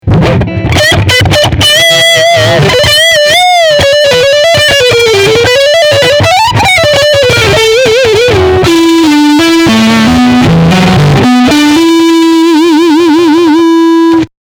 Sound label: Lead Sound